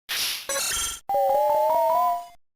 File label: paralysis